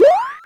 Jump; New Super Mario Bros. Wii

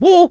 balancing; Mario; Super Mario Sunshine

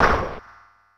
Small Hit; Super Smash Bros

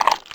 Giant Piranha Plant

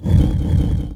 Ice Falling

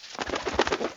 Swooper; Wii

